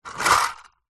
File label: leisure toy lego car push wood lift wheels spin air